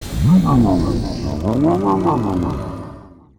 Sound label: WAR POWER UP; Mob